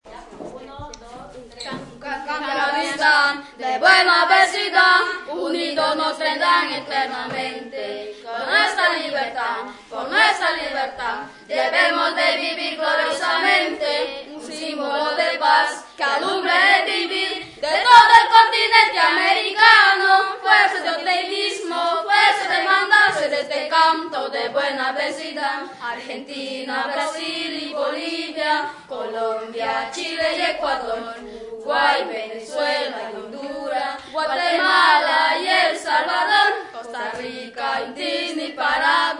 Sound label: singing; villa flor